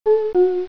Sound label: Warning; AppDisk 1.6.1